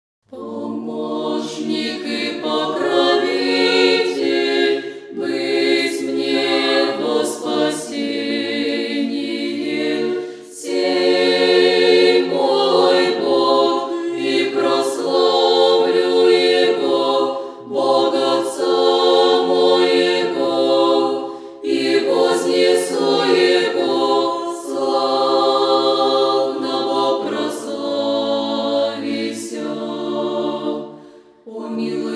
pomoshchnik i pokrovitel irmos 1-oy pesni velikogo pokayannogo kanona